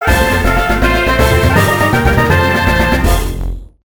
Battle capture success; infinitefusion

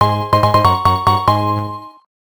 Item get; infinitefusion

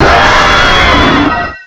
Cry; infinitefusion

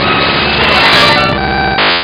Cry; infinitefusion

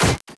drop; infinitefusion

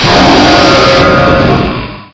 Cry; infinitefusion

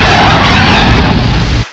Cry; infinitefusion

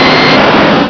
Cry; infinitefusion

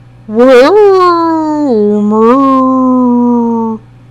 WAILMER, Cries; infinitefusion

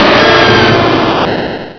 Cry; infinitefusion

